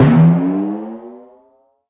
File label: Cartoon Timpani